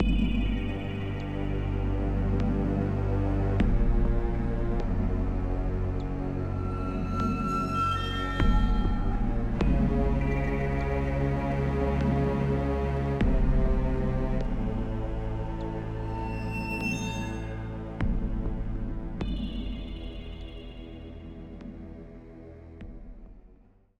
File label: BGM Amb District; Spider-Man Web of Shadows; Nintendo DS